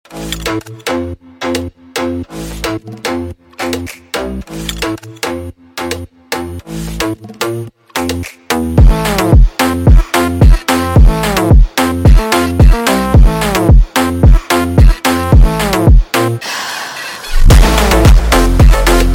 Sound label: instrumental